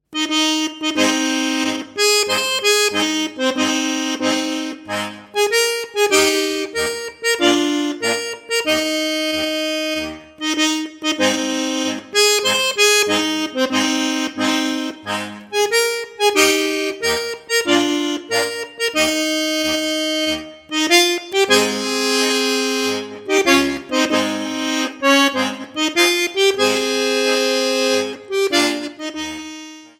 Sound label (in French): Roulez, tambours; Schweizer Volkslieder fuer Schwyzeroergel